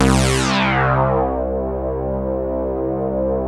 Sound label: LEADS; Masterbits; Vintage Voltage